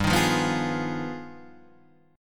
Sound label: G Augmented 7th Flat 9th; Gaug7b9 Chord {3 6 3 4 6 4} strummed